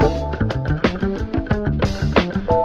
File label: full, Loops Phonky